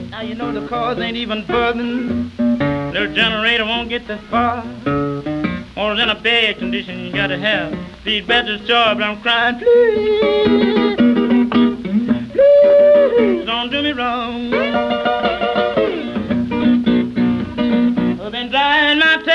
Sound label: Blues